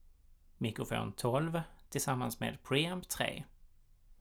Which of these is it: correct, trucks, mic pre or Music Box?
mic pre